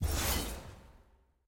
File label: lobby player swap; arena